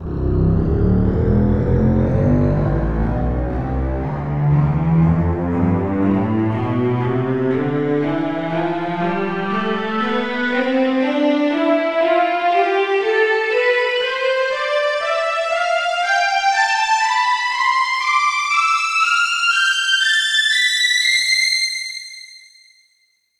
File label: tremolo tonleiter